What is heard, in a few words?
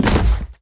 tile hard land; Original TK Sounds